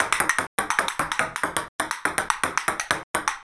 p pong

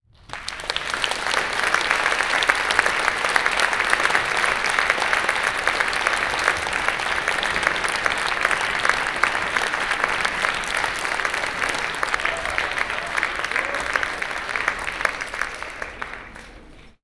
applaudissements

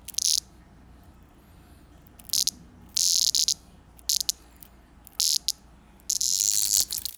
cigale brut

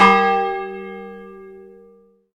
cloche

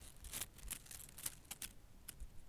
feuillage sec

